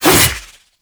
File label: Sickle Whoosh; Warframe; Lasrian; Lasrian Soldiers; Weapons; Lasrian Butcher Sickle